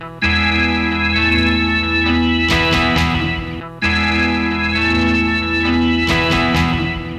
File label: relax de distortion